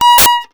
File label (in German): GEIGE; Best Service; Alpine Volksmusik